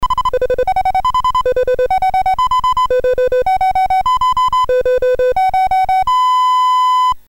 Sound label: Music Game BASIC, sid MOS6581R4; hvsc